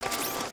Fidget Arm; emotes; Overbuild

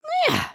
breakdance myeah, emotes

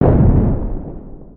Siege Tower Distant; destructibles

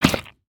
Stink Bomb Fish Flop

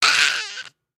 Die; Lego Strombie; creatures organic

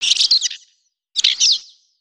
3D Birds Swallow; ambience; Ninjago

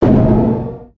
Siege Tower Distant; destructibles

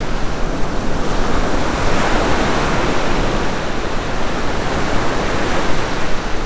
ocean loop

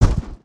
Pet Dragon Step Sweetener; creatures pets

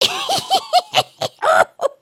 laugh

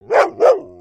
doberman bark; creatures pets

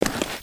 Pet Warthog Dig; creatures pets

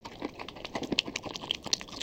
Pet Eating; creatures pets

